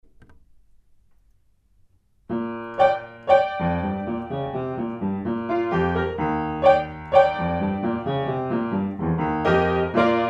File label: piano